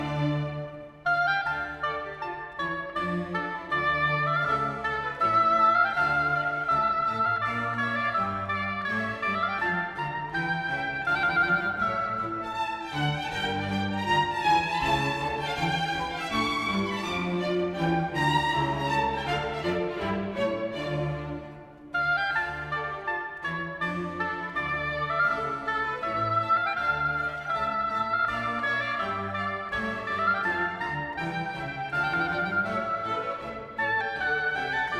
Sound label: Concerto, English Chamber Orchestra; Concerto In D Minor I Allegro e non Presto